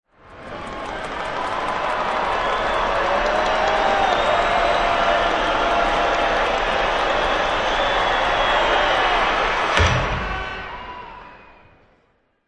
Basketball Pro Crowd Yelling Ball Hits Board, Indoor Sports; Basketball Pro game. Crowd cheering excitedly, ball being; Lynne Music